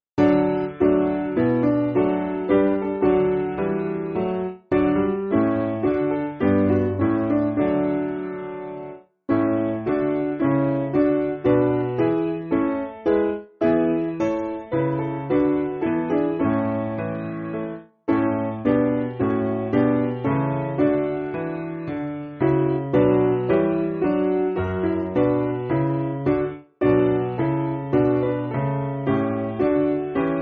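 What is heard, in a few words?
Piano, Free Music Backing Files for Churches; Spirit Of Faith; Ich Halte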